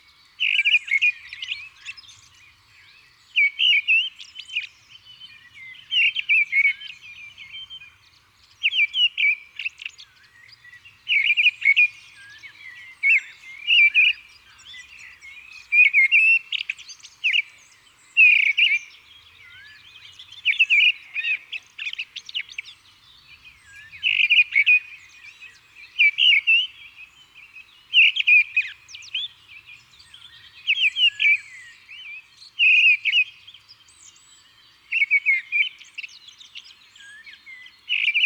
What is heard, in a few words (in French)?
Grive draine ABC des chants d'oiseaux